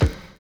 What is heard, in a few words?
SLOWPITCH